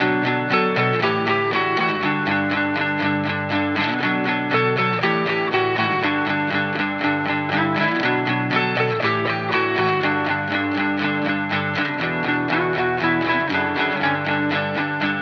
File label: 120 BPM B Min, Guitar; Ashes; Cymatics; Miami Guitar Pack - Pt 1